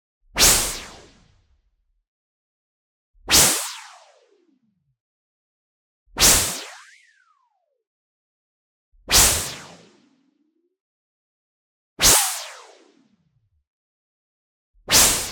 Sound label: WHOOSH Noise Filter Sweep Short Modulated; boom library; Anime Essentials